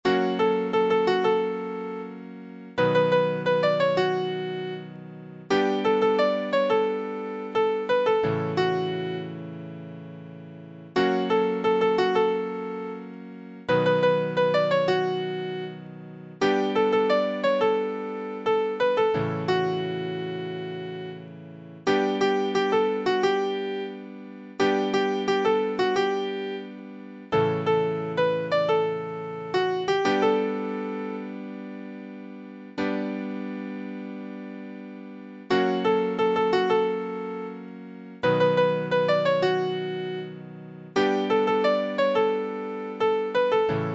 Piano

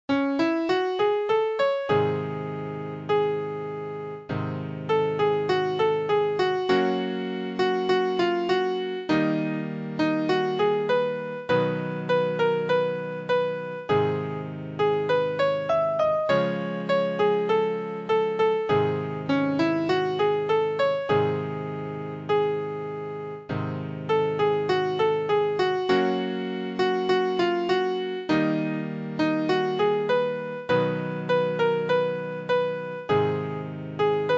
Piano

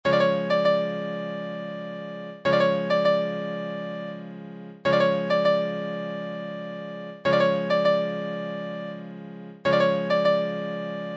Piano

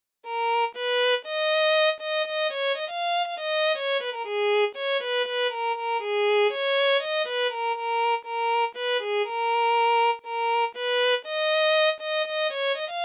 Violin

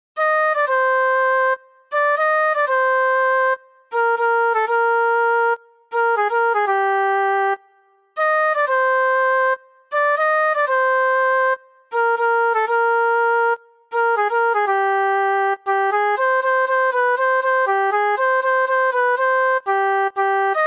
Flute

